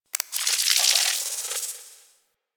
Tech Movement; Ambience

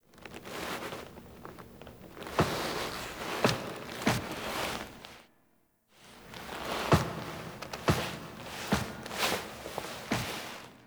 Orbiter To Lair Foley Operator; Warframe; The War Within

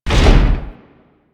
Big Door Closed; Warframe; Doors

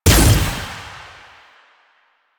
Fire Shot

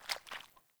Grunt Boot Walk Water; Characters; Enemy; Melee Grunt; Footsteps; Boots; Sinecure

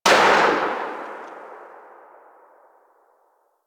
Braton Fire Tail; Warframe

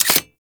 Uzi Pistol Mech, weapons; Grn Uzi Pistol

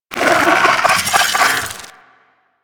Amalgam Moa Satyr Vocal Idle; Warframe

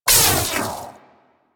Armillary Sash Impact; Warframe; Warframes; Nezha

